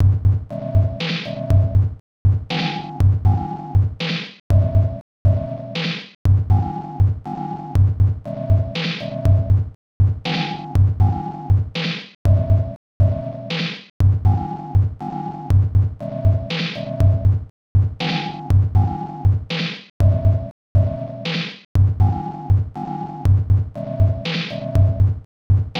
sine wave

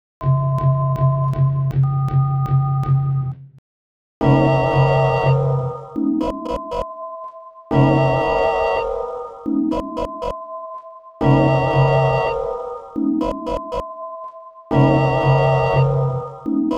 Sinewave

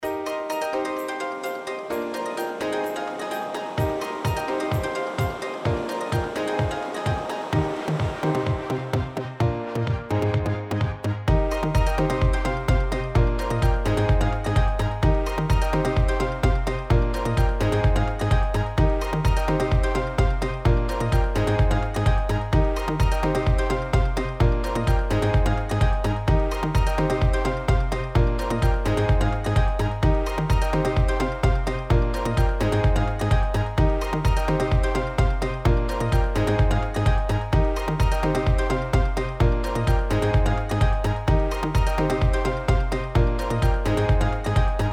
Tropical House

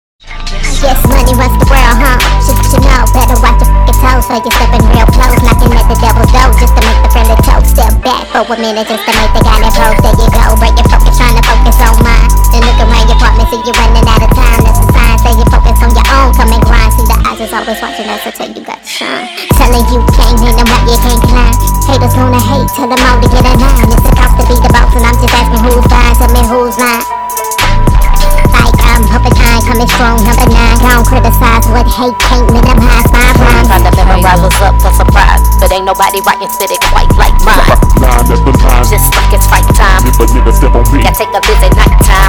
Sine Wave

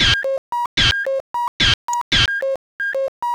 Sine Wave

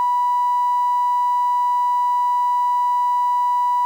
Sinwave

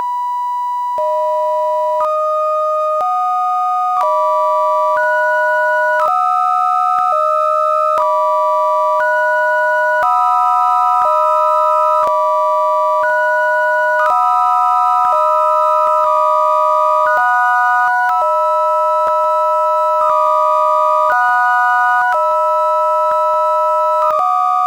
Sinewave

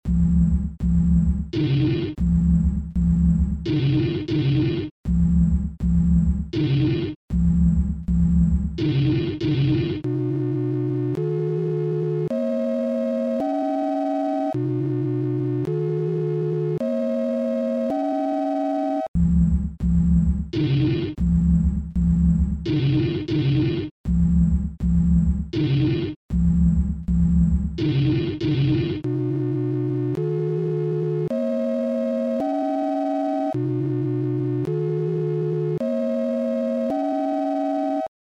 sine wave

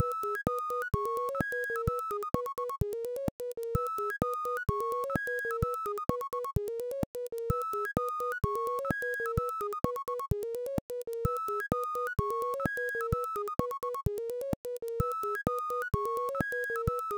sinewave

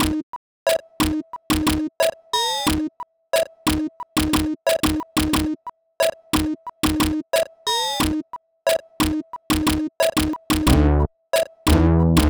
sinewave

